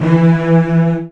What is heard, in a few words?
Virtuoso Strings, TRM FX